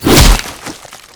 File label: flesh, combat, abilities, big claw